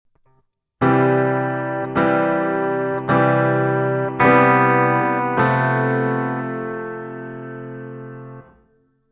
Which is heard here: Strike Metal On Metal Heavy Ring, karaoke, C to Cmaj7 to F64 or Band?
C to Cmaj7 to F64